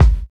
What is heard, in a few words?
fluffy kick drum single hit g sharp key, bass drum single shot tuned to the G#